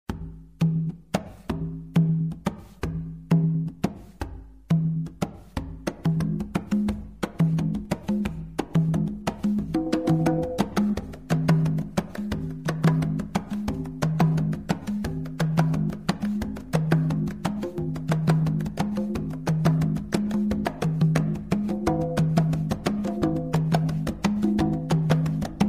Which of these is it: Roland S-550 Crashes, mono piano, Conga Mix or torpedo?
Conga Mix